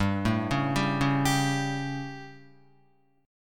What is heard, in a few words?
G Suspended 2nd Flat 5th chord, Guitar; Gsus2b5 arpeggiated; JGuitar